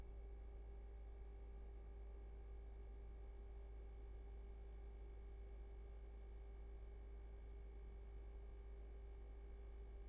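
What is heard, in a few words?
unit in garage very quiet, ASHP heat pump; Kingston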